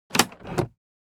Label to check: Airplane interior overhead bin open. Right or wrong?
Right